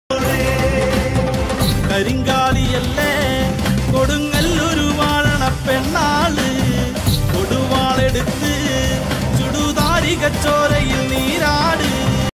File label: bgm remix